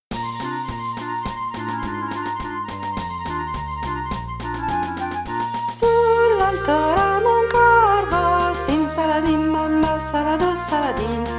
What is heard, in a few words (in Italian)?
Il Corvo E La Volpe; modulo rosso coccinella